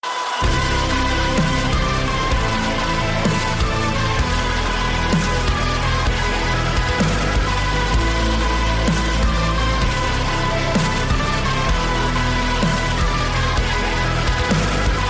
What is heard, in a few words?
music